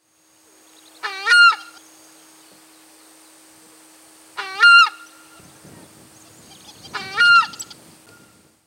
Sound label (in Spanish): chaja sonido, aves